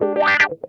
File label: HOT CHOP, Funk Guitar; Zero G - Funk Guitar